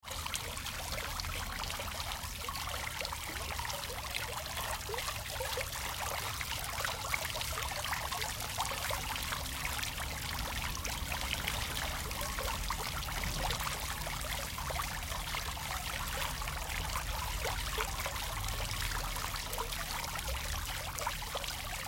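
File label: storm drain in the house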